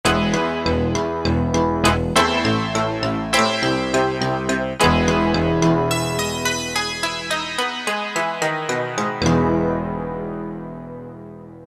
Wonderwave, Roland PN-D50-02

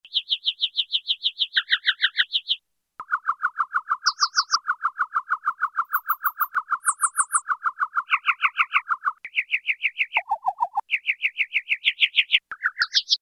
Birds, Yamaha TX81Z

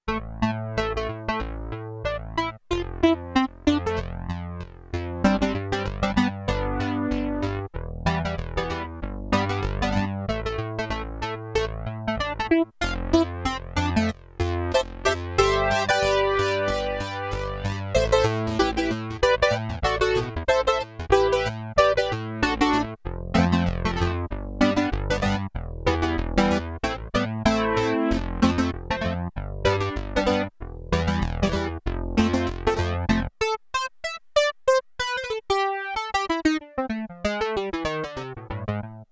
SYNTH CHOP, SIEL DK 80; Factory

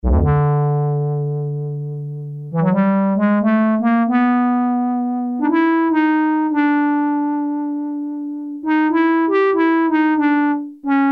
Bass Clarinet, Roland Juno-106; Factory Preset

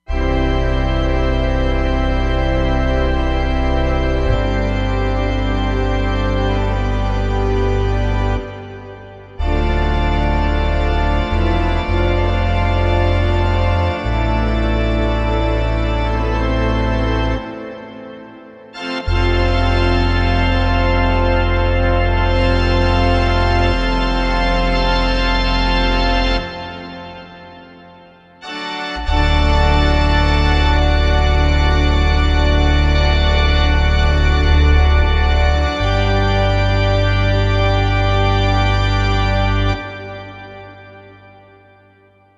Grand Pipe, Roland Fantom XR

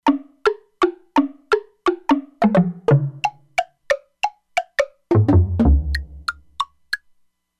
Afroblocks, E-mu Proteus-2; Factory Presets

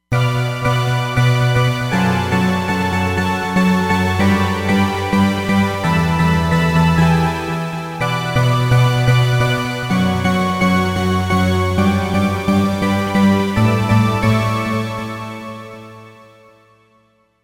Sugar Synth, Roland Fantom XR

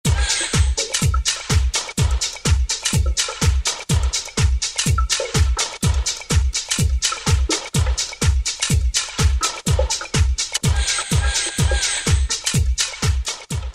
125 Floored, House Collection; Roland SR-JV80-19 House Collection

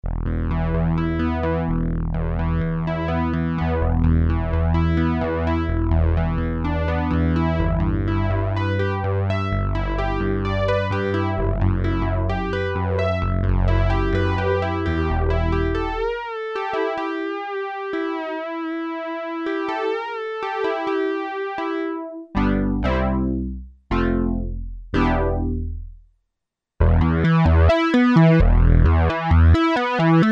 Fat Solo Sound, Korg PolySix; Factory Presets Bank A

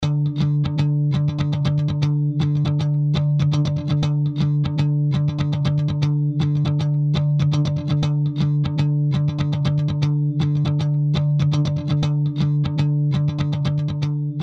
Modern Rock Guitar; Apple GarageBand 2; Apple Loops

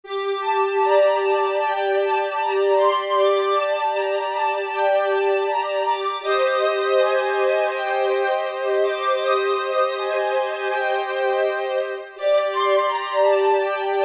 Saw Sweet, Korg MSC-12S Synth; Synth 3

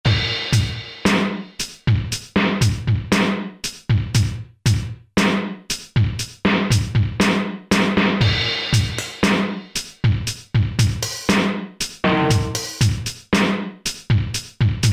Dis Kit; Alesis Rap Techno Dance

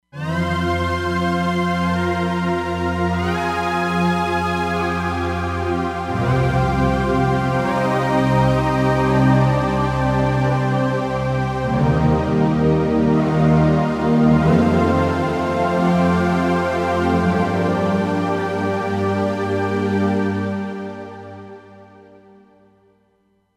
5th Pad, Roland Fantom XR

